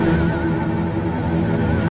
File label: random Wilds Portal Loop; finn